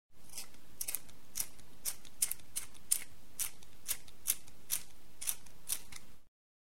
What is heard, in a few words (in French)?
taille crayon